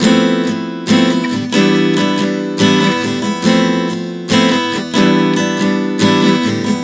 Progression 070 Fmaj7-Em7, Acoustic Guitar, Chord Progressions 070 bpm; Sonic Foundry; Songwriter Acoustic Guitar Companion